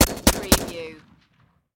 Machine Gun Sig SG5

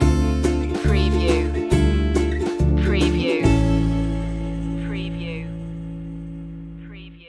joyful ukulele logo

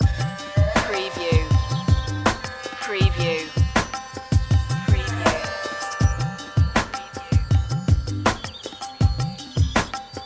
fertile land loop

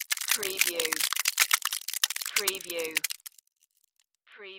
Ice Crack; Sound Effects Bible

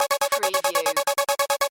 Casino Slot Machine Spinning Wheels Loop; one armed bandit

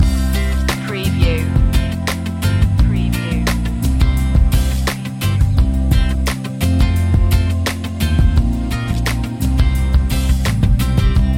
lounge isle loop

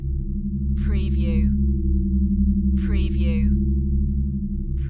Space Scifi Sound - abandoned spaceship

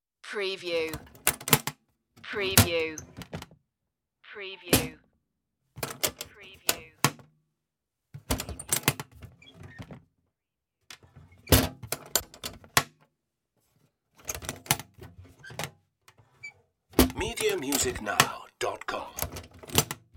metal box hinge

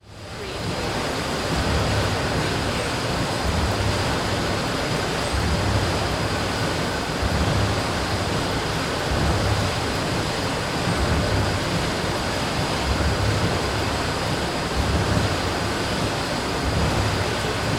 Factory Machines

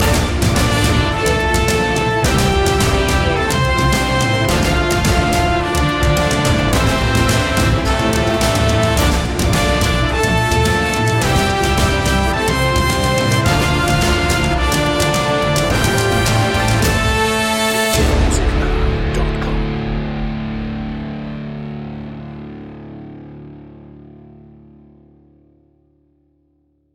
battle in 8-bit space

